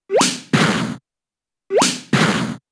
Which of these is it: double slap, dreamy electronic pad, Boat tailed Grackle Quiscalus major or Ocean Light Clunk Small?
double slap